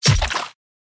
chain whip

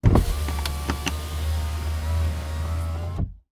car window

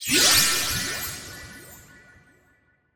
skill spark light boost

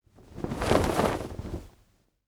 flag

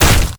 lizard bite

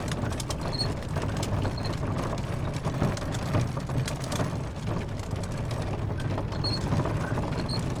tramcar wheel loop

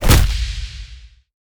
punch air wall

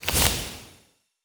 remove card

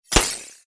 electric stick

